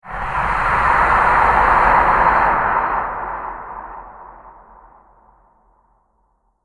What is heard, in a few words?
Breathy Space Transition With Delays